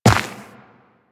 Run Dirt, Footsteps; Warframe; Tenno

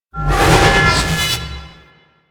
Charge Ability Charge Up; Enemies; Abilities; Warframe; Duviri; Duviri Dax; Dax Knight

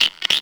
Insect; Ambience

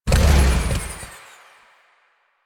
Boost Stop, Motorcycle; Motorcycle Ninety Nine

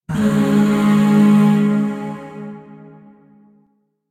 Shawzin Yo Chord B; Music; Chords; Warframe; Zariman Void Shawzin; DE

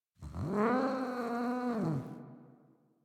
Feral Vocal Idle; Warframe; Catbrow

